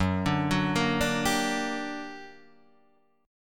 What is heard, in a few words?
G Minor Major 7th, Gb, guitar chord